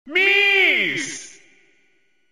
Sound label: Miss